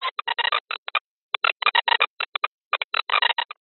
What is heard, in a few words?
science fiction radio signal interference burst